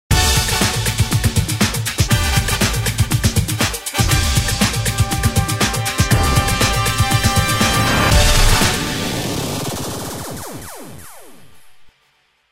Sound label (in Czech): znelka; flashradio